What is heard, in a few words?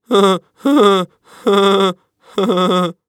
Human Laugh Nervous Male